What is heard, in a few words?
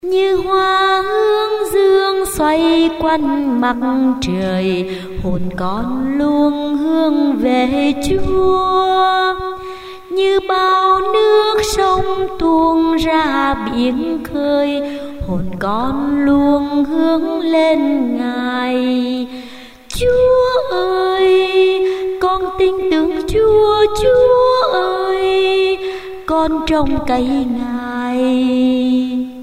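soprano alto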